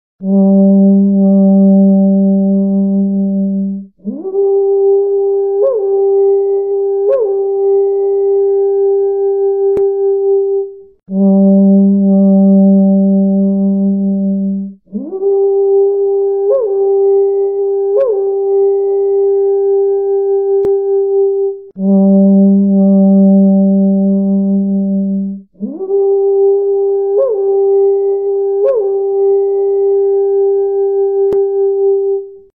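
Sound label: conch, 着信音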